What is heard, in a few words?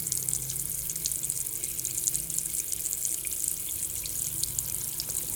sink; Golden Crayon Sounds